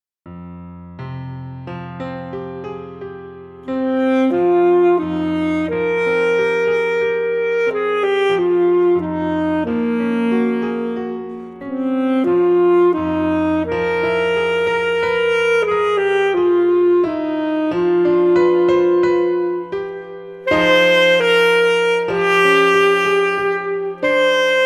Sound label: Concert Piece Soliloquy Full Sax